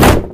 cardboard box impact bullet; physics; source engine; left 4 dead 2

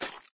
flesh, footsteps walk; player; source engine; left 4 dead 2; boomer

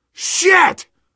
building explo; Portal 2; Source Engine; video games

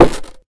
box together, styrofoam

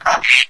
antlion grub alert

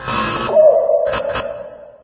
cave siren loop; video games; ambient; source engine; portal2; outland

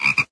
jungle menu popup; windows 9x; sound schemes

